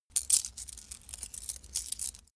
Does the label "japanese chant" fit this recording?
No